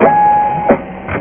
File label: scan; Thunderware Demo